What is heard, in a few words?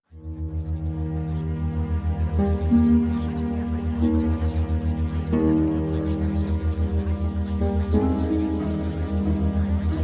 Music; Premium Subliminals